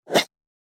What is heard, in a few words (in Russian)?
Резкий переход